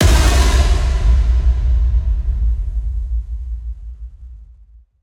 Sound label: Smash; Trailer Hits & Impacts; Federico Soler Fernández; Effective Trailer Hits & Impacts